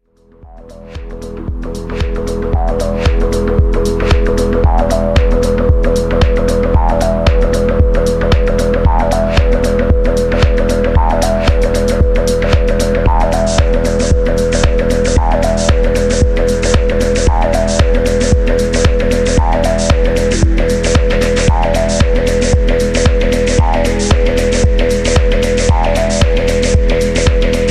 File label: drone logic